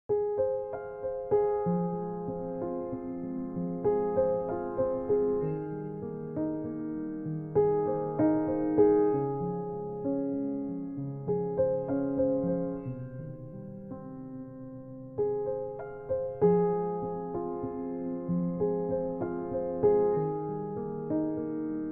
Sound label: Piano Morning